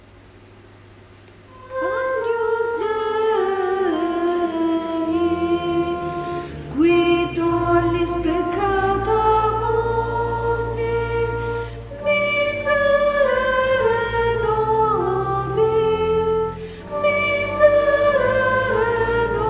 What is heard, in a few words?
Agnus Dei SOPRANI e TENORI; Agnus Dei di Lourdes 2019